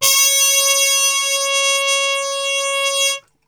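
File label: C#3, Brass, trumpet harmon mute; Best Service; Brass Super Section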